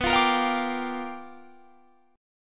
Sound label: G Minor 7th, B; Gm7/B; strummed